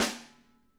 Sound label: SNARE; Sonic Reality; Interactive Drum Kits